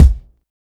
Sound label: subtle reverb kick sample c key